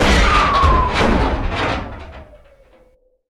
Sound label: Scifi Forcefield Deactivate; Energy & Forcefields